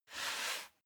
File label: step barefoot wood plank slide normal; schritte; Footsteps